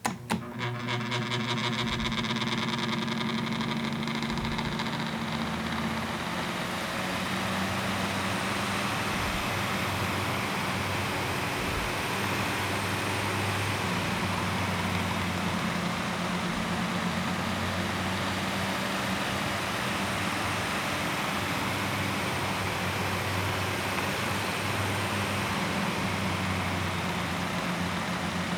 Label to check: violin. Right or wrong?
Wrong